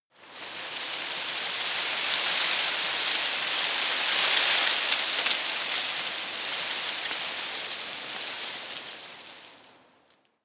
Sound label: tree foliage rustle medium